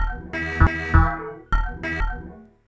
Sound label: Industrial 140